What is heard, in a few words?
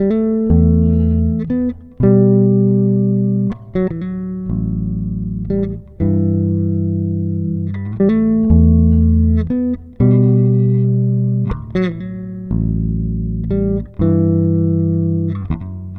Processed Electric Bass